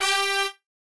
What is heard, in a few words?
music cluck